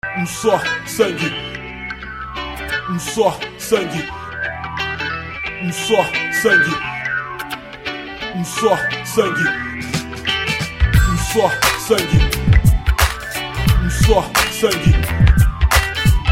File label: beat